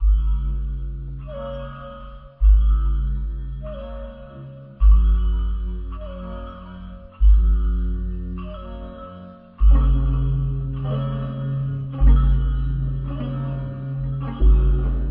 100bpm, beat; beat pour mcee; un look en loque

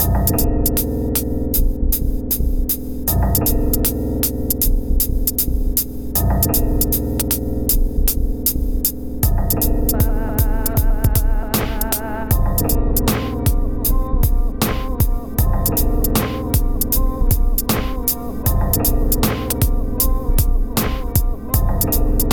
78bpm; noventa; beat pour mcee

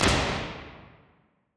Wood Room Large; Max Audio Effect; Convolution Reverb; Real Places

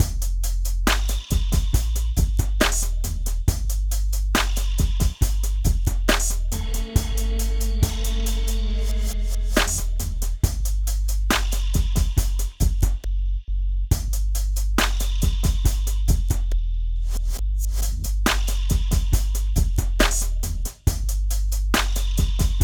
69bpm boom bap simple

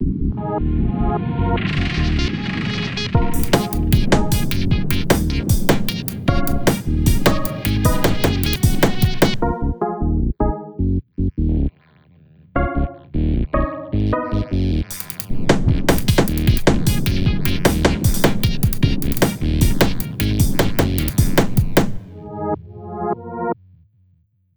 153bpm; generique

